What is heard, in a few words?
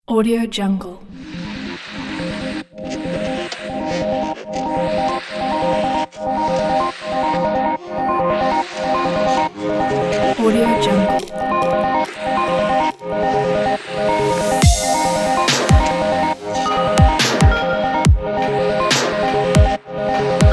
ambient atmospheric hiphop